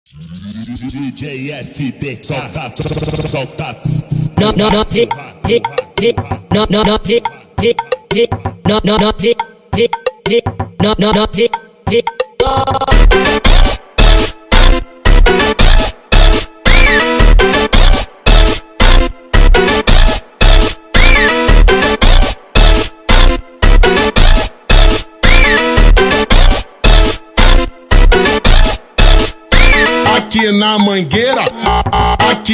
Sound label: slowed Reverb